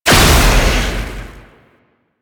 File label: Dome Start, Medusa Puzzle; Duviri